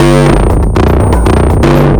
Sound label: Processed Beat, Drums & Percussion